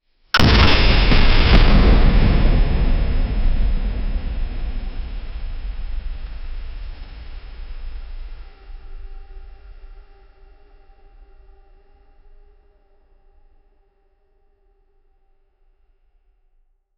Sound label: a light flick, approaching; 0 5 seconds